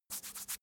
brush back and forth

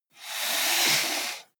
computer mouse draw long

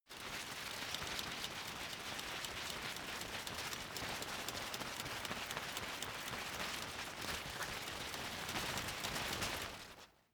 umbrella big shake rustle soft

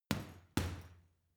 boxing punching bag uppercut double medium

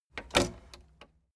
tape deck open slide

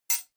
knife hit metal clank

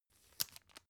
tissue open soft

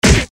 fist hit seq

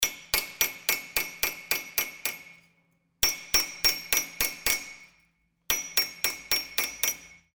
car workshop hammering

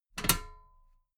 metal ladder step regular clank

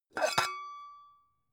pan open hard

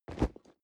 nylon leather bodydrop soft

